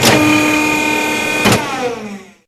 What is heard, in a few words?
mecha hydraulic